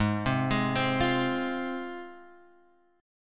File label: Ab 6th Ab, Guitar, Standard; arpeggiated; JGuitar